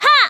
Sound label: kiai; MonsterHunter; Fight